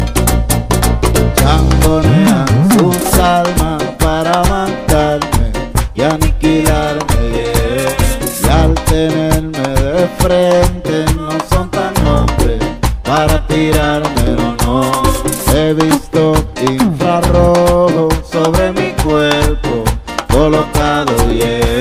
merengue, ringtones latino

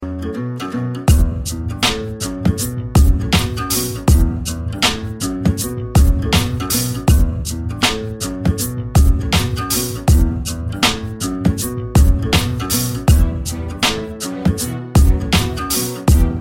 guitar modern

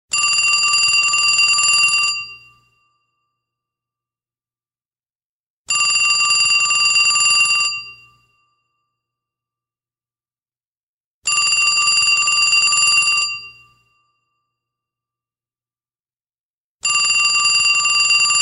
old ring phone tone; ringtones

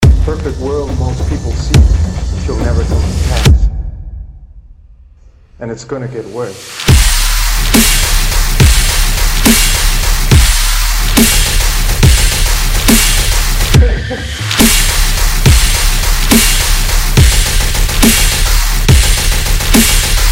skratch therapy tone

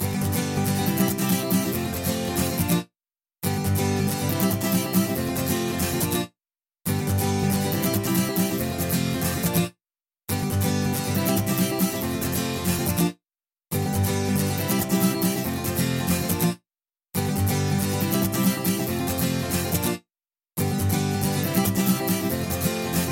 Guitar Ringtone

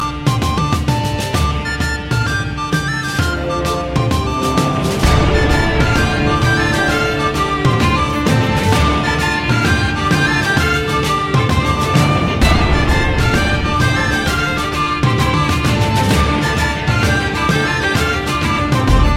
irish tune

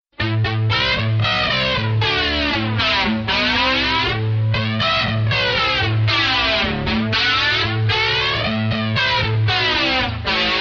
Flanger

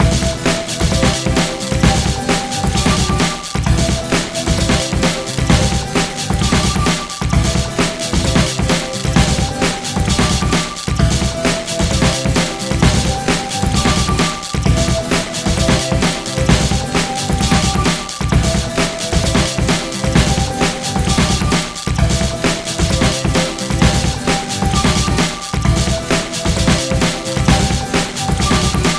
Piano Ring

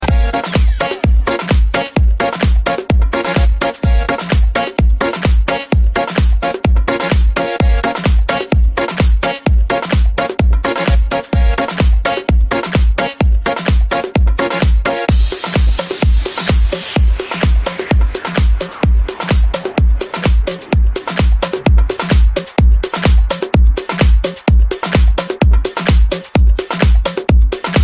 latino remix

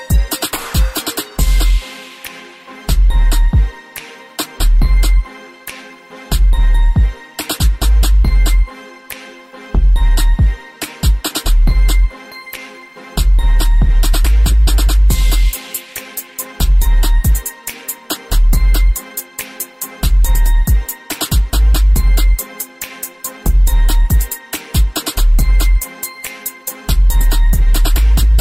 Karaoke Beat

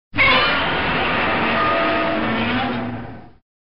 roar; godzilla

